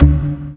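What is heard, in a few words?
check, chinese chess; flutter chinese chees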